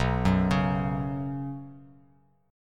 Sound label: B Suspended 2nd, Bass Standard; Bsus2 arpeggiated; x,2,4,6; JGuitar